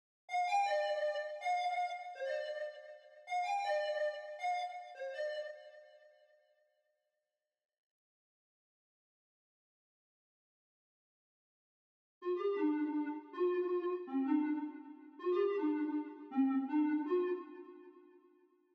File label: 161 BPM G Maj Lead Synth; Cymatics; Destiny Gold Expansion Stems; Hidden Hills